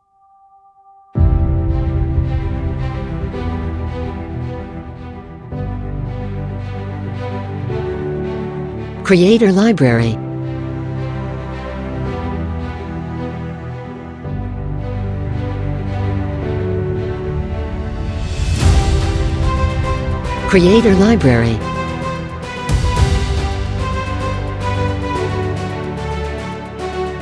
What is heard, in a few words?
The Epic Background Title, cinematic music